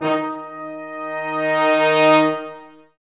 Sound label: BRS, Lrg Ensemble; Roland L-CD702